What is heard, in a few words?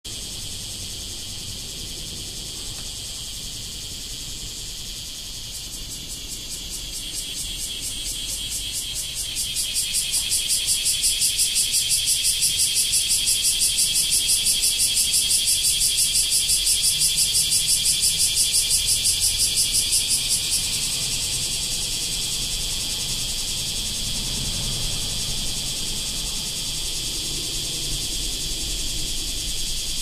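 cicada; semi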